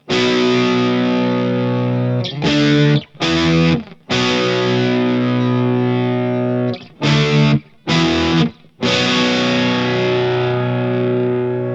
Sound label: Orange od; Mooer GE1000